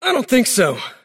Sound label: deny pain; davion; dota